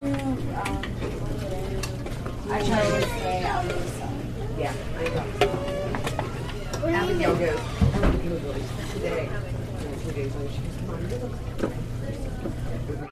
Restaurant Walla Track, Restaurant walla track from a restaurant; Restaurant walla track from a restaurant in nc